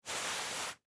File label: Foley, Cloth, Wipe, Hand, Movement, Canvas, Tarp; Washing Sound Effects